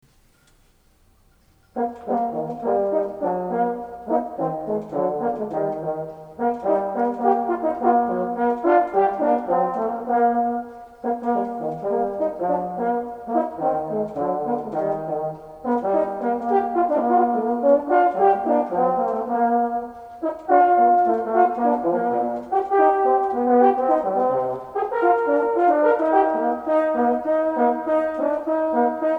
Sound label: DUO GAVOTTE